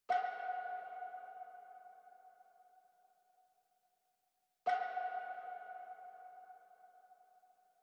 105 BPM F# Min Pluck; trap; Melodies; Loop Stems; Cymatics; Trap Starter Pack; Apollo

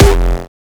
kick oneshot lilmonster F; GhostHack

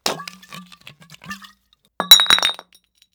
Beer Bottle Grab Drop; Fairlight Sound Lib

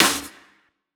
Snare, Dubstep Snares; GhostHack

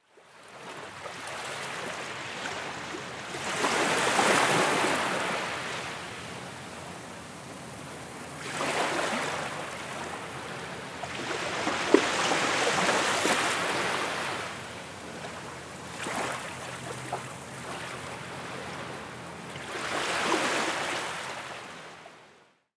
Nature, Ambience

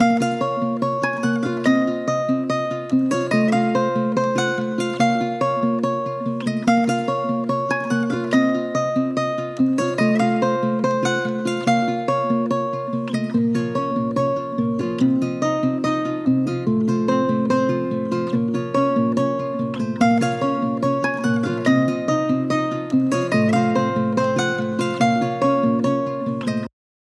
72 BPM A# Min, Guitar Loops; Evora; Cymatics; Journey Guitar Loops

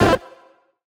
Retro FX; Cymatics; Video Game Sample Pack Vol 1

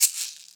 Studio Shaker; Cymatics; PHARAOH Beta Pack; Pharaoh Premium Drum Samples (BETA)

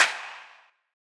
Snare, Dubstep Snares

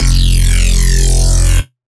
Bass F Neuro; Dubstep; Bass One Shots; Ghosthack; Dubstep Sound Arsenal; 50 Bass One Shots

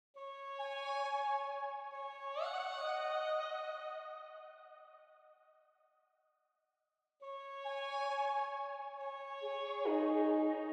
136 BPM C# Min Strings; Melodies; Loop Stems; Cymatics; Red Sea; PHARAOH Beta Pack; Pharaoh Premium Drum Samples (BETA)

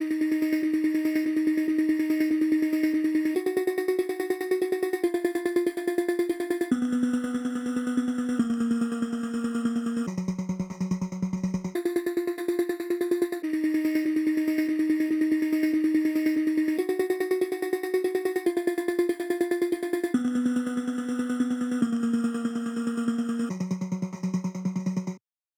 143 BPM D# Min Stutter Vocal; Melody Loops; Loop Stems; Cymatics; Hyperbass Pt 1

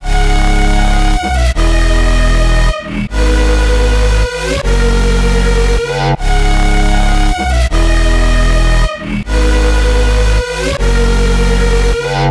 156 BPM G# Min, Hyperpop; Melodies; Angel

